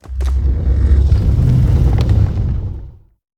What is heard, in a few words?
Tree Gate Open